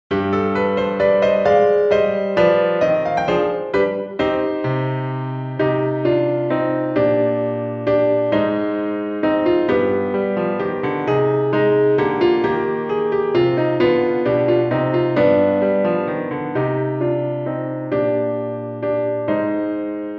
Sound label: Minuet Accompaniment